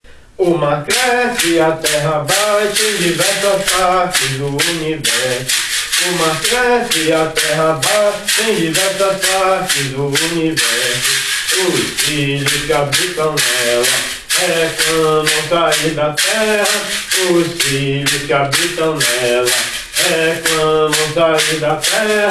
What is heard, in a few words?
Mazurca